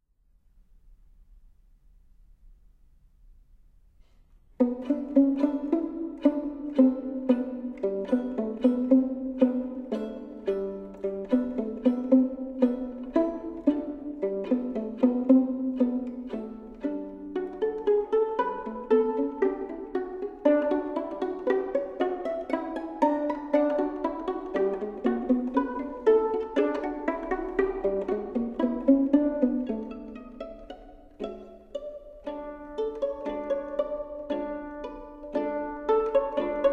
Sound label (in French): Duos pour violon Pizzicato